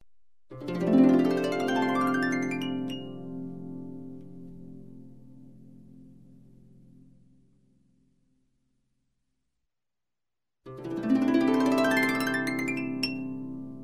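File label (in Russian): звуки арфы плавное скольжение глиссандо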